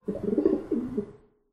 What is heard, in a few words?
amb nature pigeons